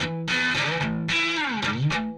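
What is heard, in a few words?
Distortion Riff Guitar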